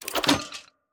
宝库拒绝已领奖玩家声

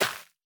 缠根泥土破坏声

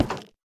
下界木栅栏门开关声

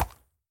马在软地面行走声

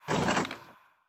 待机声; 凋灵骷髅

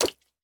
青蛙长跳声

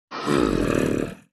僵尸村民叫声

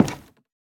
樱花木门开关声

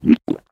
蜂蜜瓶饮用声

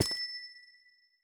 紫水晶踩踏声

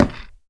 嘎枝之心放置声

